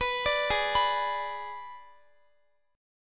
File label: G sharp Diminished G sharp, Guitar; G#dim Chord